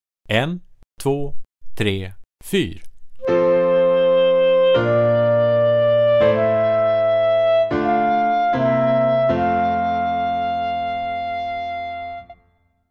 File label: Chord; Initial assignments